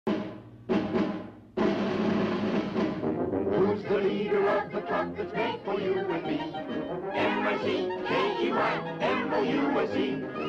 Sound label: march; mickey